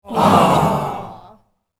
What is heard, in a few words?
crowd reaction negative